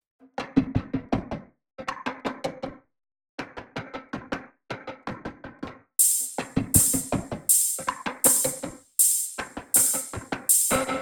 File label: loop perc 160; Seismic Loops